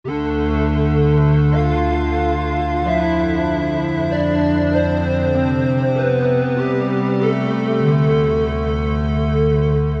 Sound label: Square Pad, Korg MSC-12S Synth; Synth 3